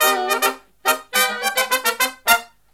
Brass Riffs; USB Soundscan vol.29; AKAI

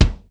Roland R-8 dbl head k1

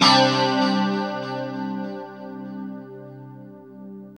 2CHD C 4 -L, Guitars; Spectrasonics; Hans Zimmer Guitars VOL-1; JC-SHEEN

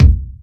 KICK, Fresh Disco House; USB Soundscan vol.20 - Fresh Disco House I

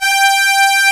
SYNTH LEAD, Emu Classic Synths; E-MU Formula 4000 Series Vol. 3; Analog Odyssey

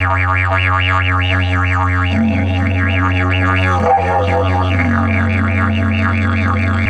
F 140, DIDGE RIFFS

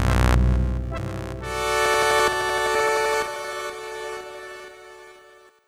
Nazz clouds, Pads; Sonic Foundry (Sony Creative Software); Synchro Funk

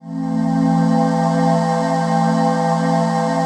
ATMO PAD, Dance Mega Jungle Rave; Best Service

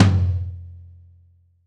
Brush Tom

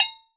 Agogo Low; Roland BK-5; Percussion

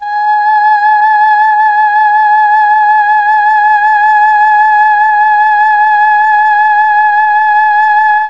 G#3 FEMALE, Mellotron; CHMB FEMALE; Mike Pinder - Mellotron Archive CD

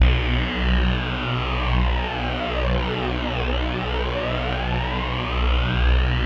synth, Hardcore Breakbeat, 153 Bmin; Big Fish Audio; Hardcore Breakbeat Action

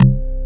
Korg CX3, C 1

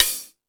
House Machine, E-MU Pro-Cussion

